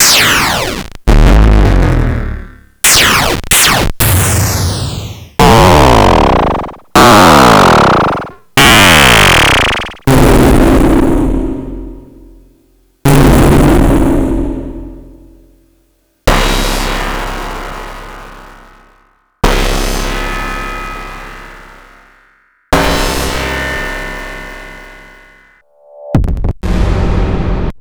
Beeps and Noise

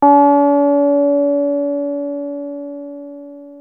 303 C4, TB 303, Synthesizer; Hollywood Edge; Giorgio Moroder Rare Synthesizer Collection

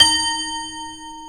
D#3 TOY piano; AKAI S6000 CD-ROM - Volume 1

